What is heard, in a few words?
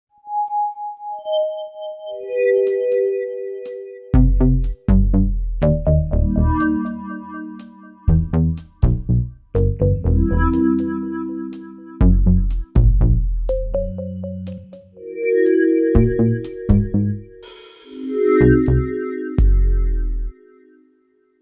music loading